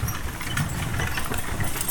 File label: Horse Drawn Wagon; Electa Quinney